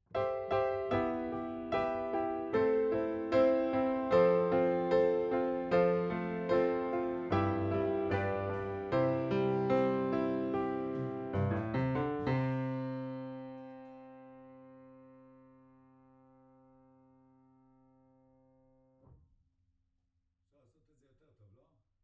Piano B

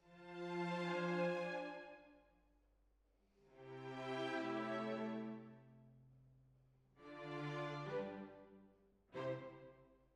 Hall

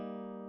E.GTR Gefell

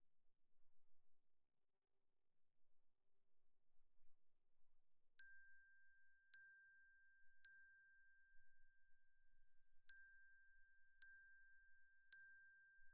Glock Close

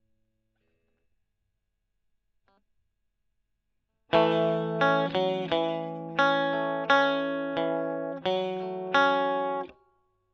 E. GTR Gefell

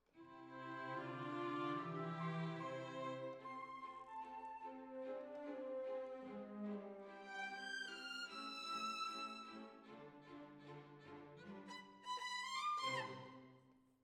Violins, jazz for piano

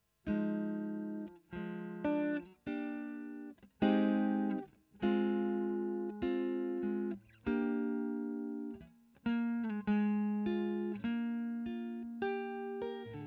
E. GTR Royer

